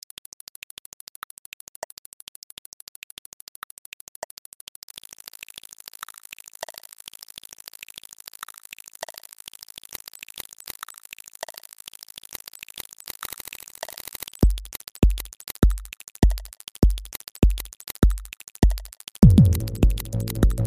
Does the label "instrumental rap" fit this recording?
Yes